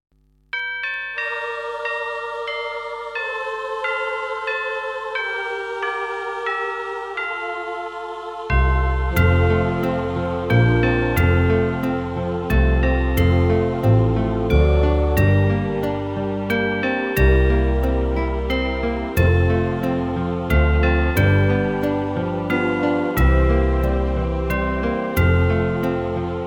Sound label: Away in a Manger Instrumental